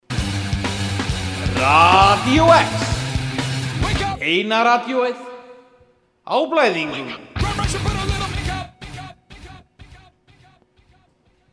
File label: jingle; Radió-X